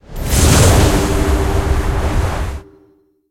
twister, spells; Fate The Cursed King

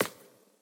footsteps hewn; Fate The Cursed King

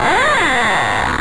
door; Monster girl quest Part1,2,3; Visual Novels

